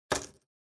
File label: Pocket Watch Put; 懐中時計02-12(置く)